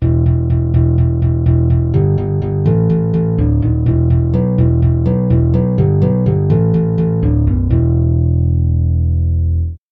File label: nylon string guitar bass samples